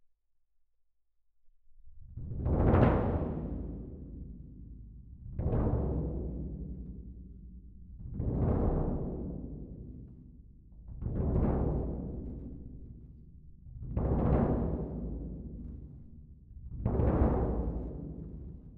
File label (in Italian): Cassa Main L, piano